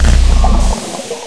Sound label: gurp; quake2; waspy